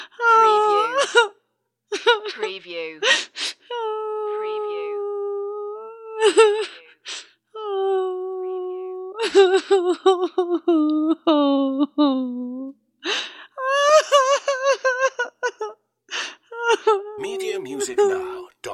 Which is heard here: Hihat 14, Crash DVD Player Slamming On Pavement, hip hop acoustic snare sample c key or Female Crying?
Female Crying